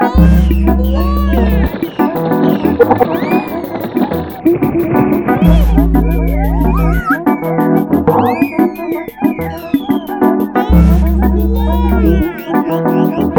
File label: Ufo Bluegrass; puss soundtrack